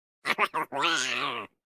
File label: crab Big Win; reef raider